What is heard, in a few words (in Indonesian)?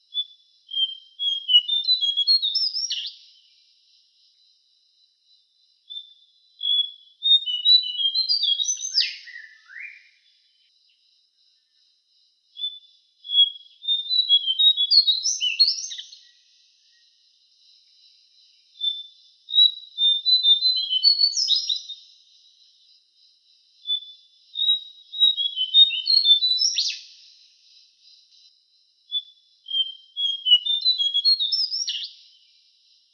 suara cingcoang cokelat